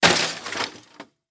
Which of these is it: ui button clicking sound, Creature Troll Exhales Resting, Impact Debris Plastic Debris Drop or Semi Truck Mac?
Impact Debris Plastic Debris Drop